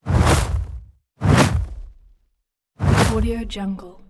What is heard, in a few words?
whooshes; transitions and movement